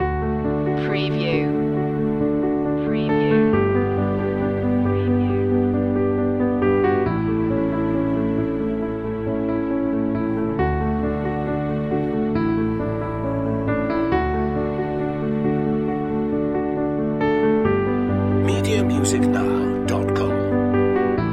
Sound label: forgiveness loop